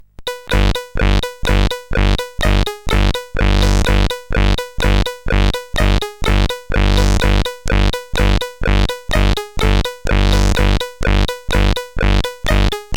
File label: 8 Bit Winter